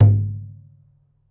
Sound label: Kendang, Gamelan